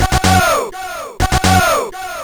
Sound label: beatbox; Pinball Dreams